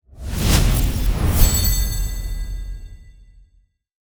ui settlement normal

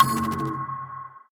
lantern

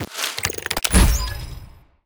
ui load

